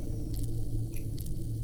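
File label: Music Dpad Evaporate 145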